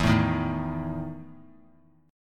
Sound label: E Major 7th Suspended 4th; strummed; JGuitar